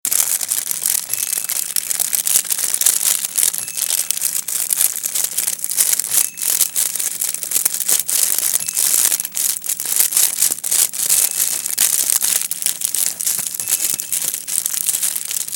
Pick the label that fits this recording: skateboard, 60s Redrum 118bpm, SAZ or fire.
fire